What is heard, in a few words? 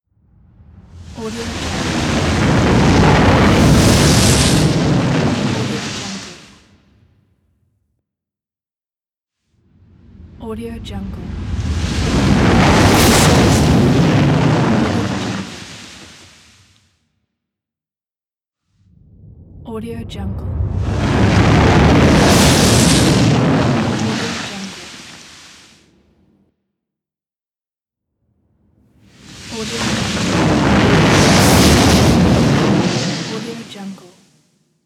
fire flame